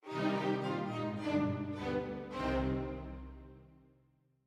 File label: Stinger Event Dramatic Short